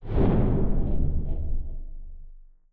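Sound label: Collision Interior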